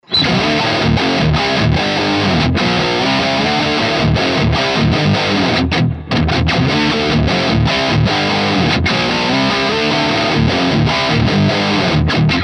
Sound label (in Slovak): Dual Diody Vintage 1 kanal